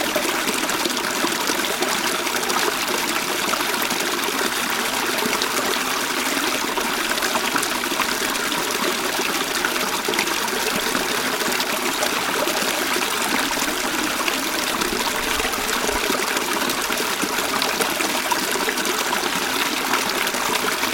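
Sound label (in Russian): журчание водопада создающее ощущение комфорта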